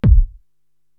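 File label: bass drum Deep Down, Korg TR-Rack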